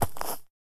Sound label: grass, footsteps